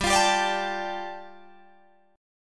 G-7th Suspended 2nd-G-17,20,17,19,20,17 down Guitar Drop D